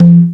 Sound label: Linn, LinnLinn Drums